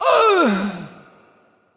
Death; Creature; Bolvar Fordragon

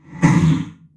Wound; Deathbringer Saurfang

